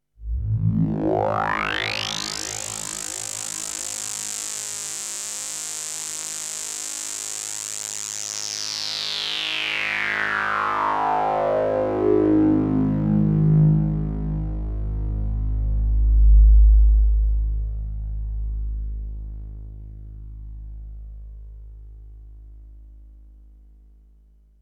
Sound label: CS40M Attack